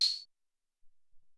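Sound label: Synth Conga, drums; Alesis SR-18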